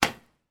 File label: firework small launch eject; sound effects one